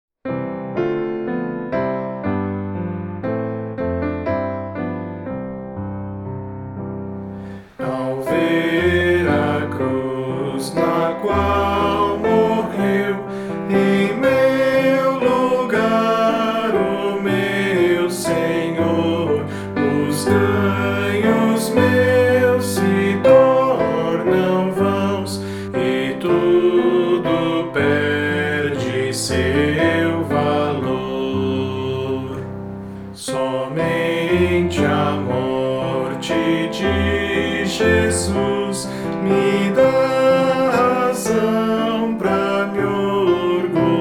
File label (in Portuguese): voz piano